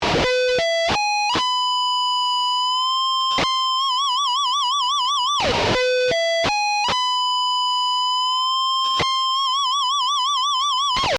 Exotic Guitar Lick

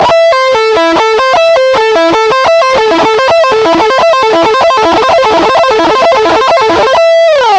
A minor 3 String 2nd Inversion, Sweep Picking

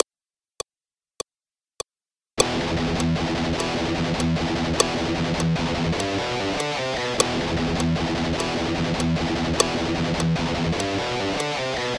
Ahead Of Beat, Tight Rhythm Guitar Playing

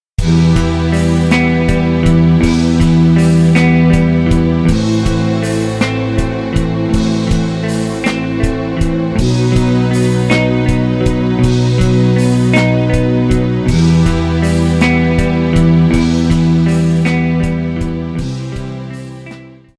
Rock Tracks

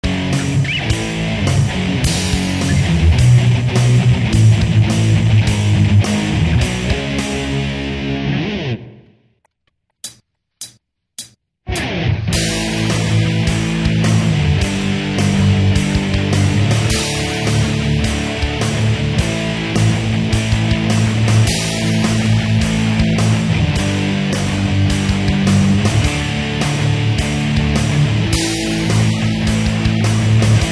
solo section

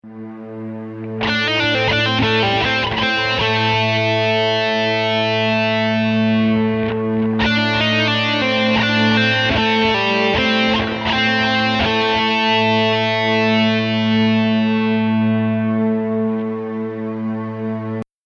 Lick, guitar

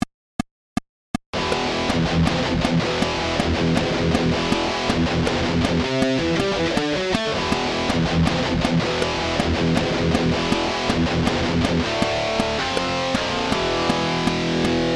Rhythm

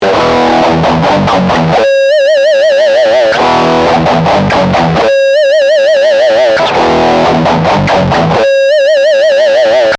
Pinch Harmonic Riff

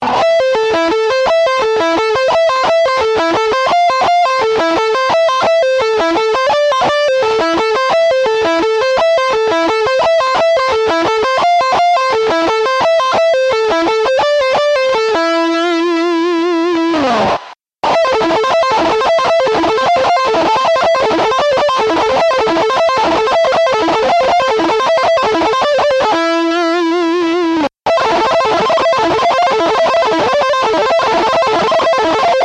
Double Accent

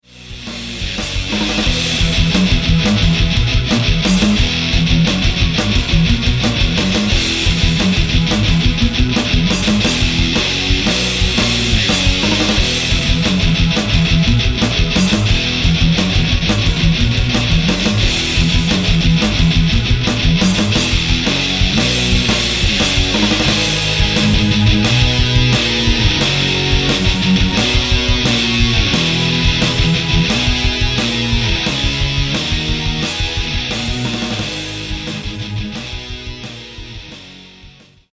Rock Tracks

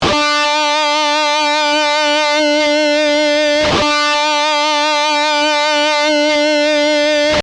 Vibrato Narrow, guitar solo

